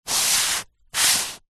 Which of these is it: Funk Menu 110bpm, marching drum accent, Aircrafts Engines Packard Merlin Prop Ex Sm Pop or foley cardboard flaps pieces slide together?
foley cardboard flaps pieces slide together